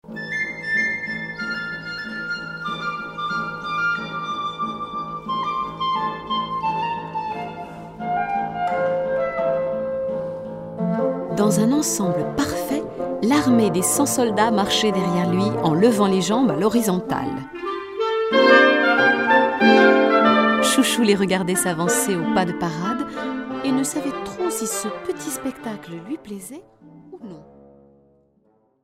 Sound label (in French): boite défilé militaire